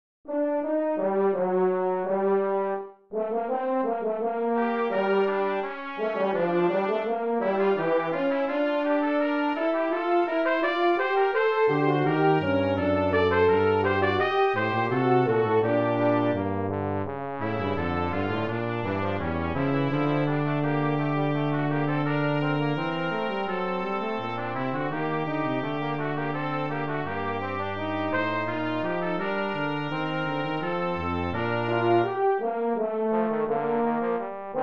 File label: Fugue, Brass Music